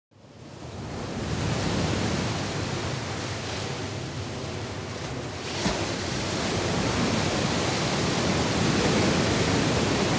Waves of the sea, ocean

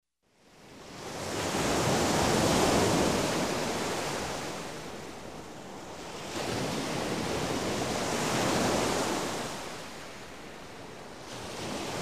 Surf, coast, light waves; sea

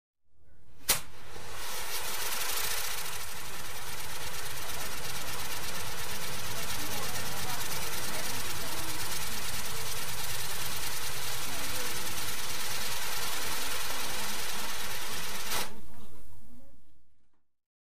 Pyrotechnics

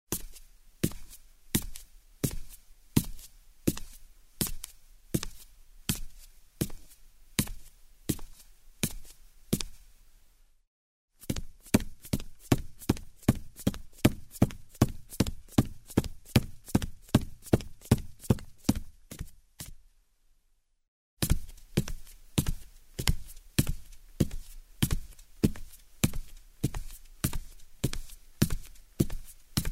Barefoot steps on wooden stairs

